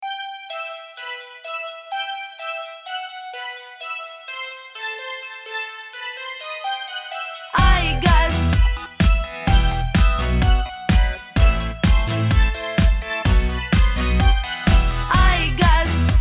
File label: aygaz jingle